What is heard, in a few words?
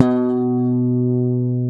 WAL BASS; AKAI S6000 CD-ROM - Ultimate